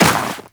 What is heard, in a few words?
Walk Snow, Footsteps; Warframe; Tenno